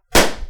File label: Gunfire